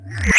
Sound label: spooky